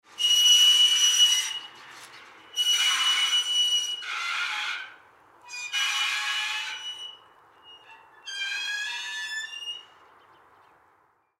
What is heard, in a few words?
Windmill Rusty, Windmill Rusty Squeak Metal Farm; Squeaks; Industry; Objects; Blastwave